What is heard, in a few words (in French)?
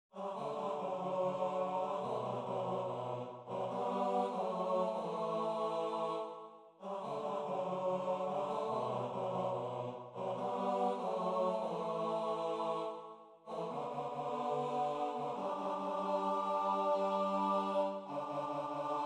Tenor Basse